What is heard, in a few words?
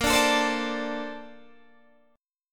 Bb Major 9th, Bb, down, Guitar, DADGAD